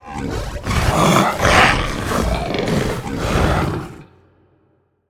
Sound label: npc troll idle combat intimidate; Skyrim Special Edition; mihail frost giant